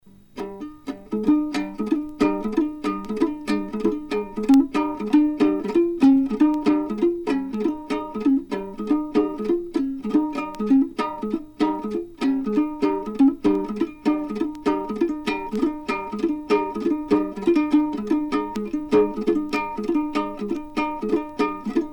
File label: tibesti keleli, tchad; tardena